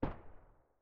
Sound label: ump45 silencer mono far